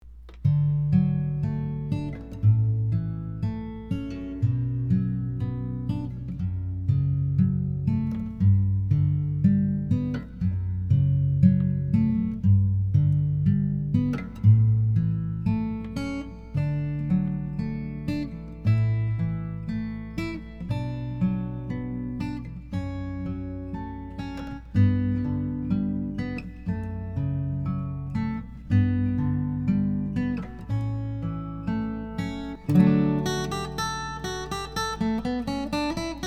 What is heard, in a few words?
Guitar; Canon in D; Pachelbel